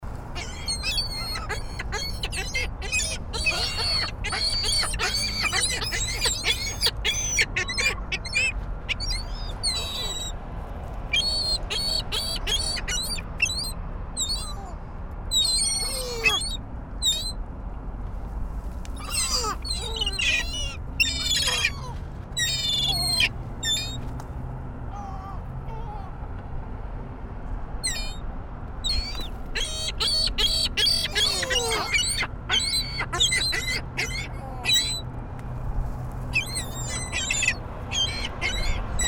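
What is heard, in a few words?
Ring-billed Gull, Baltimore, Maryland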